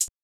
Hit, Closed Hats

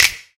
Snap; Percs

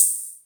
Open Hat

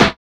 Snares

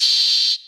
Open Hat

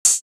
Open Hats

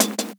Closed Hats

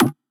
Perc

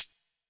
Hat, Closed Hats

